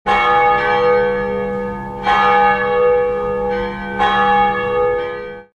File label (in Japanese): チャペルの鐘